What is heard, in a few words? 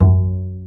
STR Cb Pizzicato; Roland L-CD702